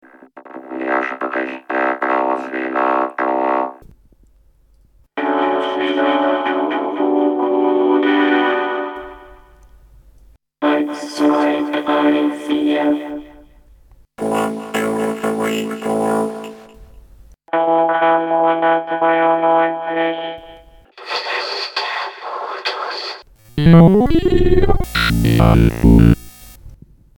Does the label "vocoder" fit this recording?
Yes